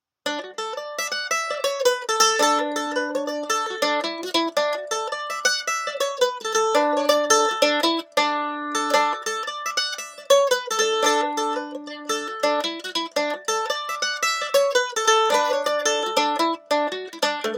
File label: Mandolin Pick Comparison